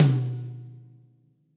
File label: Tom Low Cold Tight Room; Drum Hits; Standard Hits; Ableton; Factory Packs; Session Drums Studio